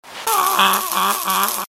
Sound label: Electro; Troll Face Quest Unlucky; Android